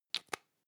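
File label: lipstick close hard, Bathroom; haus-garten; badezimmer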